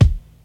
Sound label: kick drum sound G sharp key